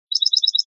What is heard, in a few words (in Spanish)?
cascabeleo fino; campero